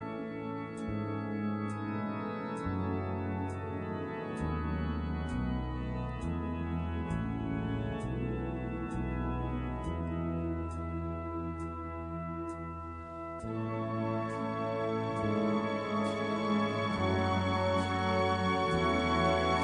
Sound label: Air on a G string